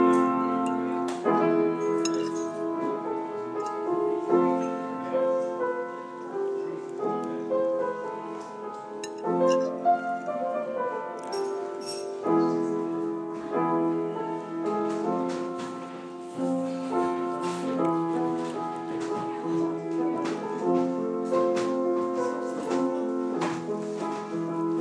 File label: piano overheard in java